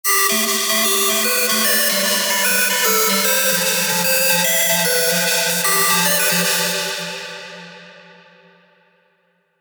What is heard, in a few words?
Lead Hardstyle Sample